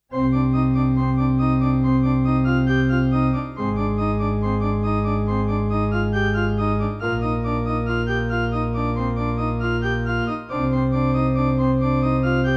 Pipe Organ

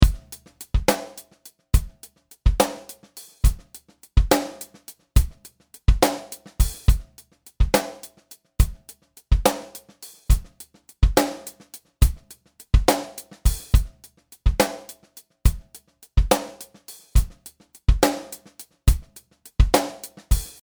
drum gate comp

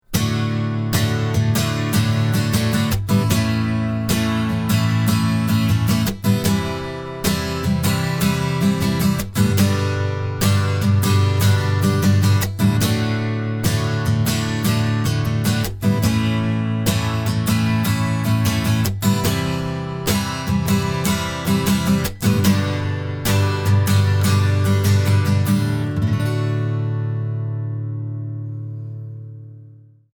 strumming

